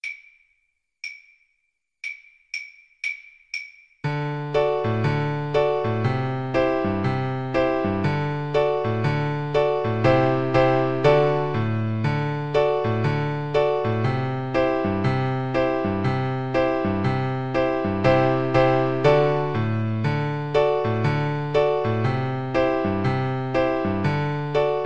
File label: guitar